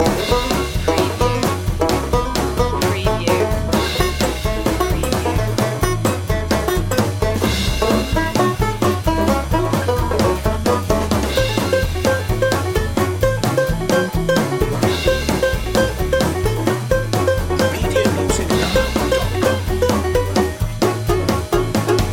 swing loop

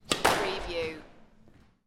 Sports Squash Serve To Wall

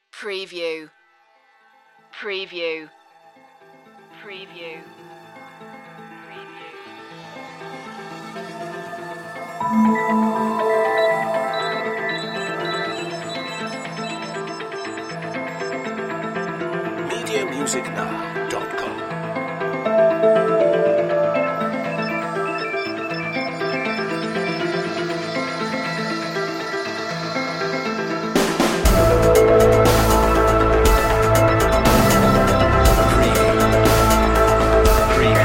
underworld series dramatic cue

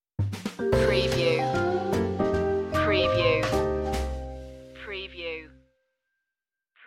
jazzy stinger

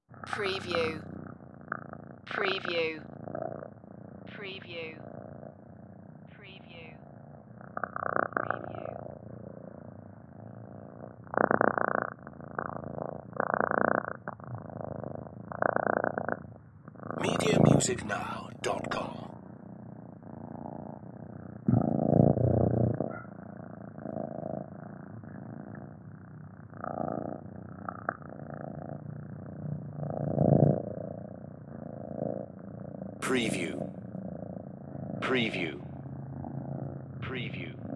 Cat Purring Long Deep

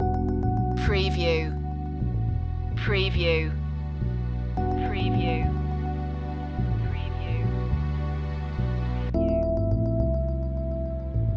acid jazz loop

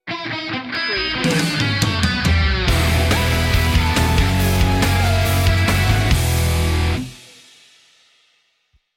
thunderbolt sting

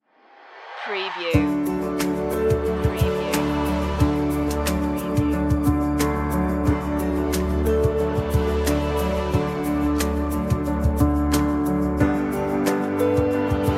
inspiring beauty

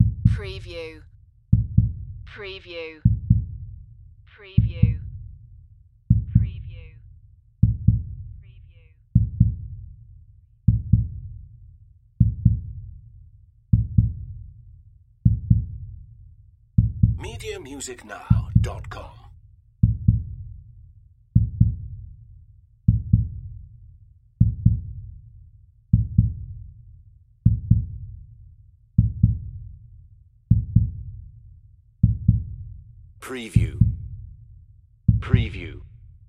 Heartbeat Slow

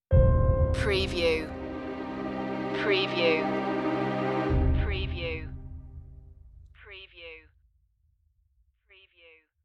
vacuum stinger

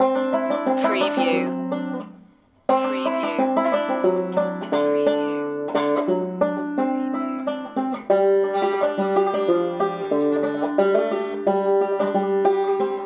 60s version no drums

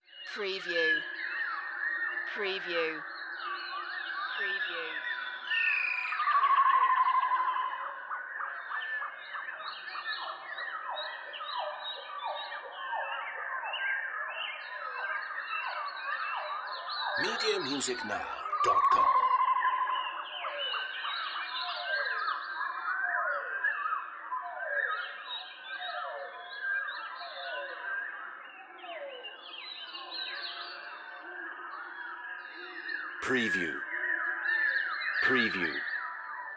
Ambience Jungle Day

